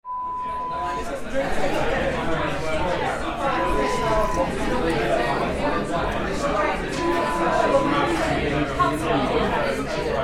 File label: Restaurant sound